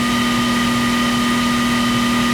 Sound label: 88 Pontiac Loop, Automobiles